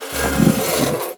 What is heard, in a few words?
Classroom Chair Drag; Ambience; Warframe; TheNewWar; Zariman